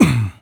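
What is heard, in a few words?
small grunt, voice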